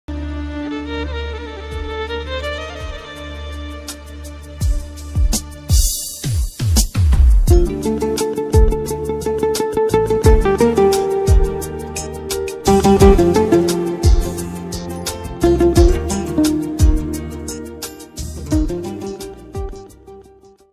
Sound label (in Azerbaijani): zeng ucun musiqi; zeng ucun mahnilar; zeng ucun melodiyalar